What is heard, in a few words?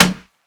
snare beef; Hip-Hop; Drums; Drum One Shots; Slate; CAICOS Hip-Hop Sample Pack